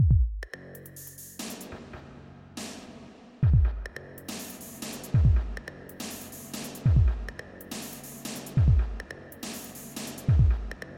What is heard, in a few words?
Gunshots